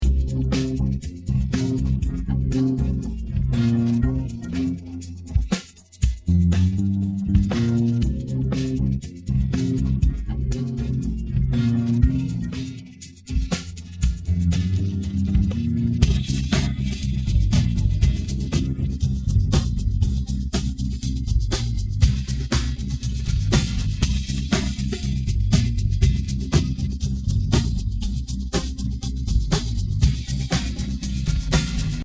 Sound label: Song Bass Perc